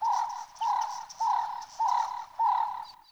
wetland forest day dove; Ambience

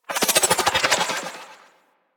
Fan Energy Trench Projectile By; Warframe; Warframes; Protea

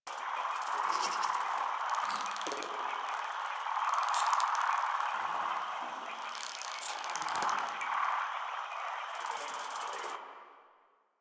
Landscape Mushroom Forest Loop; Warframe; Ambience; Venus Open World; Positional Stereo Loops

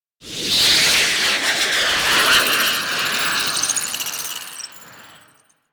Thermal Shock Ring; Warframe; Runner

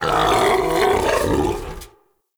Battle Dog Death; Animals; Vocals

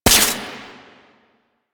Mech; Warframe; Fulmin Prime

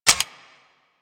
Security Camera Motor Start; Objects

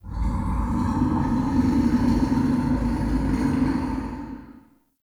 Cave Ocean Creature Far, Ambience, Ocean Cave; Midrath Island

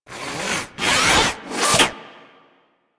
Foundry Working To Loop Sleep; Warframe; Liset; Hub; Machines; Tenno Foundry

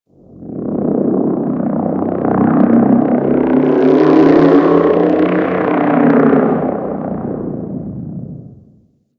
Exterior Small Ship Passby; Ambience; Warframe; Corpus Railjack

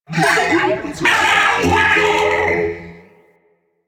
Sentient Ghost Caster Attack, Barks; Warframe; DE; Sentients; Sentient Eidolon Ghost Caster

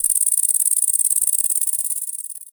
Ext Insect Close; Ambience

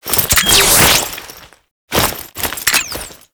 Boss Fight Foley; Warframe; Captain Vor; Captain Vor Redux

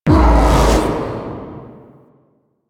Spear Gun Throw Proj Buff Hit; Weapons; Warframe; Tenno; Hoplite

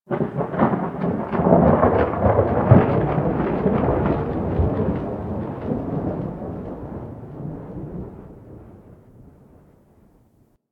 Hub Cephalon Suda Rand Thunder; Warframe; Ambience; Tenno; Syndicate Cephalon Suda

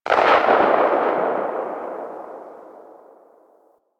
Sniper Rifle Tail; Female

